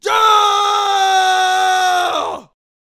Super Boxer Attack Vocals

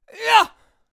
Bomb on a Stick Attack Vocals

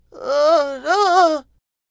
Cactus Attack Vocals

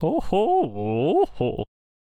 Chariot Attack Vocals

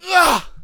Hoplite Death Vocals

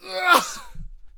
Hoplite Attack Vocals

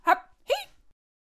Dragon Carrier Attack Vocals

